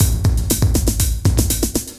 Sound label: CB LOOP; Zero-G; Chemical Beats